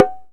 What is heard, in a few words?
Indian Percussion Tabla; Mango Loops